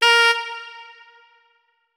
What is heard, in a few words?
notes, saxophone; Hideout Furniture